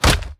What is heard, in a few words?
Warrior Damage; We Are the Dwarves